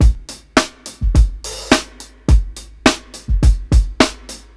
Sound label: 105 8TH BEAT, DRUMS LOOPS; BASEMENT DRUMS VOL 2